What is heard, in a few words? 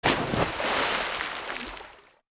rock splash